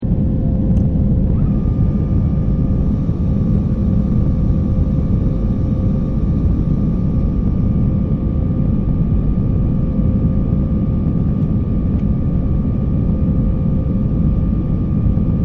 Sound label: Airplane Ambience; Airplanes; Vehicles